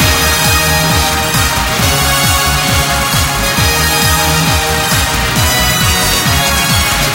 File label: epic win loop; rome